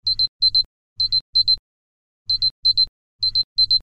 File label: Alarm Tone; Alarms; Spice